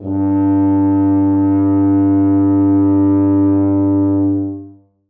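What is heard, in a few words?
tuba sustain g2; Sonatina Symphonic Orchestra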